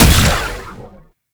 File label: Pistol Projectile Explosion; Weapons; Warframe; Tenno; Temple Signature